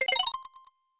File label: message block; smw2